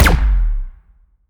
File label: explo gas can swt; weapons; Half Life Alyx